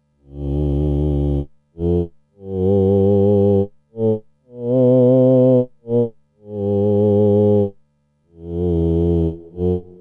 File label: theremin